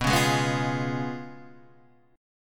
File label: Db 7th Cb, guitar; Db7/Cb strummed; JGuitar